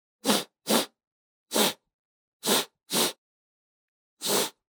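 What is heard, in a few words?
sniffling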